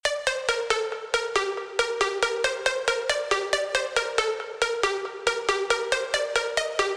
Plucks Trance Vital Trance

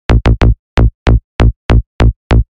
Bass Vital Psytrance

